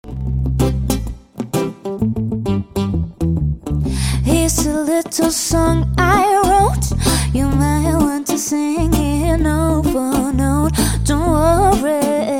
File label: Lounge